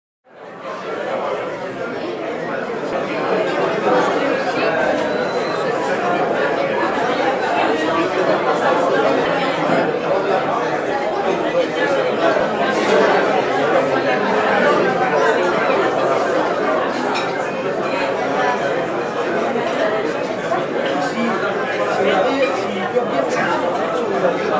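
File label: sounds of bar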